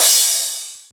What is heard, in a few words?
Crash